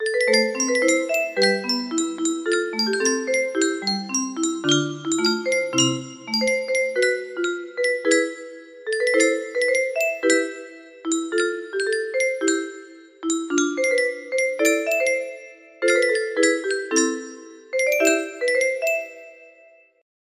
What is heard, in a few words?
melody, music box